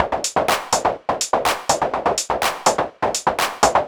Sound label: 124 Perc, Drum Loops; Tatanka; 124bpm; Nu Dance Electro